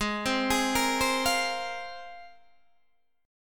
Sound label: G sharp 6th Add 9th G sharp Guitar DADGAD